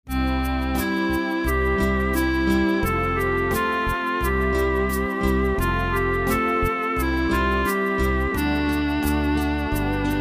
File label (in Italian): melodia e accompagnamento